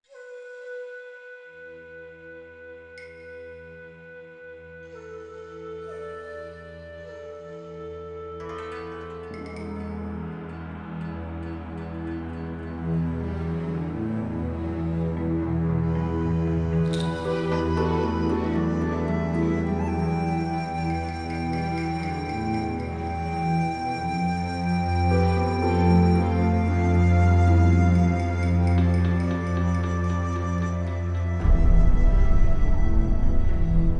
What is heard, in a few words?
Background Music; Platoon